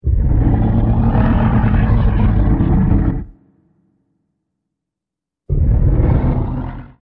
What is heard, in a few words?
roaring tyrannosaurus rex